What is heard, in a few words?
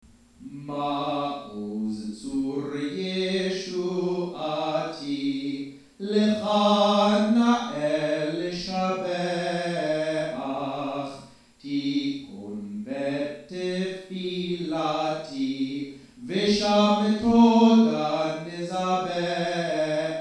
Maoz Tzur in Hebrew